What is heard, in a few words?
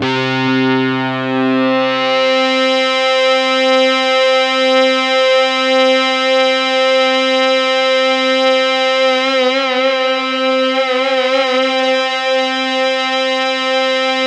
C2, Synth Line; NiceBeats